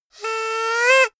hoot rise

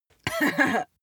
female laugh short

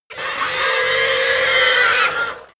pig herd scream short

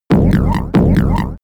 rhythmic alienation

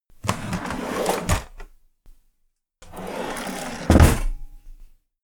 drawer open close

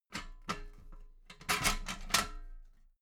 metal ladder small handle unfold soft

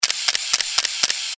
camera autowinder

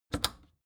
record player power click off fast

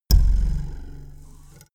ruler twang

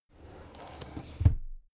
drawer cabinet crammed close soft halfway

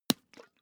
hot-water bag punch light

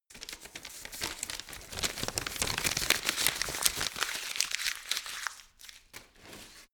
paper crumple

